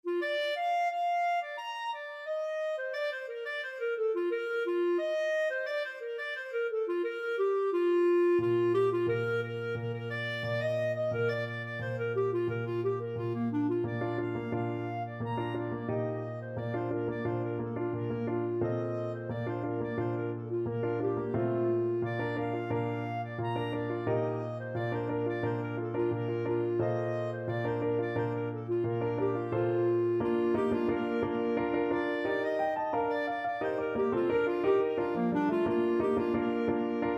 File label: cotton eyed joe, clarinet